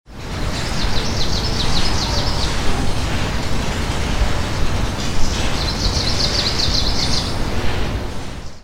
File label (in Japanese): オオムシクイ